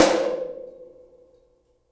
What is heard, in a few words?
Snare Shard